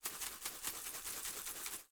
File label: Foley Seasoning Bottle Shaking